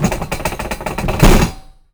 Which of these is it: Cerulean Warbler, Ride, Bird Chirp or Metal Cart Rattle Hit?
Metal Cart Rattle Hit